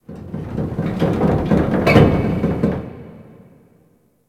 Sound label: Crp Ice Metal Rattle, Ambience Ice Planet; Warframe; Corpus Ice Planet